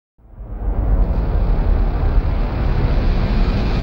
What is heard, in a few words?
storm